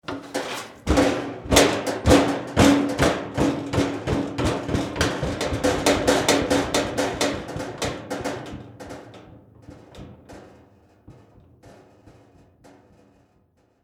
Trash Can Set Down, Household, Trash, Can, Set, Down, Metal, Garbage, Can; Wobble